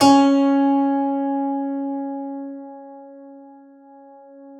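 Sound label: harpsichord C#4; KLAVICYMB; Xsample VOL-8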